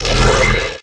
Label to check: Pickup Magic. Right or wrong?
Right